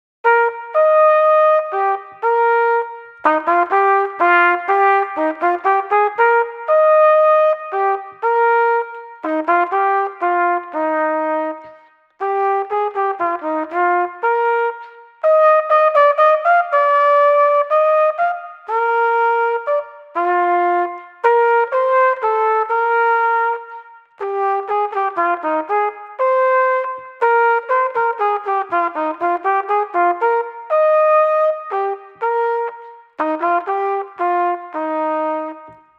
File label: Menuett